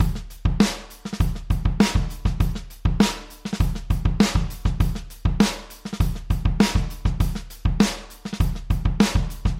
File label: Funky Groove, Звук барабанов в стиле Funky Groove